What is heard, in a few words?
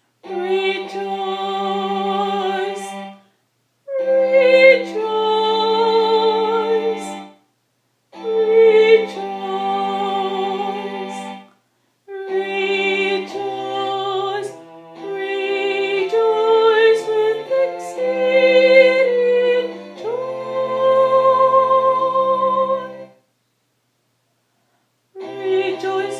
soprano